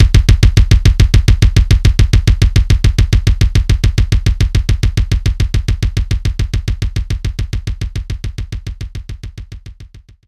Standard Kick; knights try

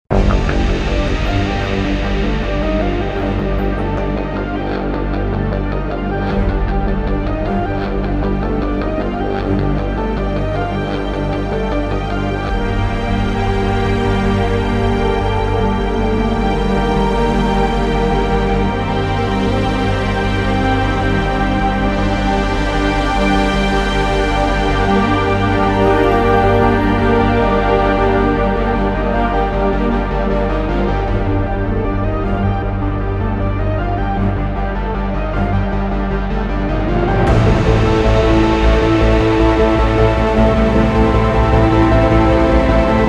Station Alert; mass effect 2 the arrival soundtrack

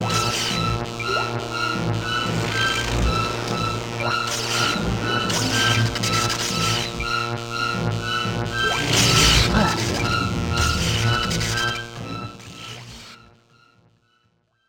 electric wires; conkers bad fur day unreleased tracks

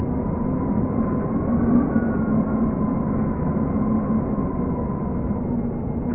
Windin; Buggy; PS1

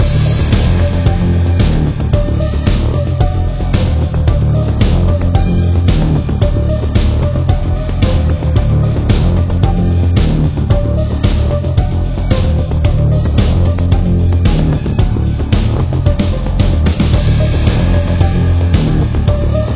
Tension Loop

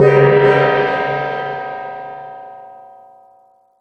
Musician Bell Player Hit

